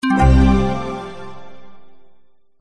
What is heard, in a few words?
gameover; word wipe